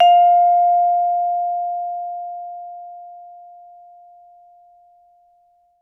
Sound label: Vibraphone sustain ff F5 stereo; MIS Pitches - 2014; Percussion